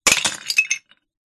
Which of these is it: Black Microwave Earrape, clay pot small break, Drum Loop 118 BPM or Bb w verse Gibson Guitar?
clay pot small break